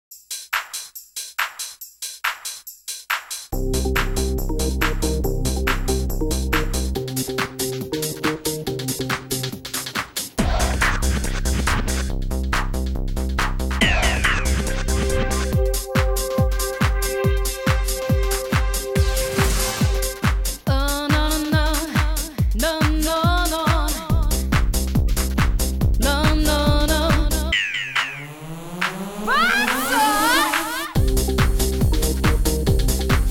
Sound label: Trance Intro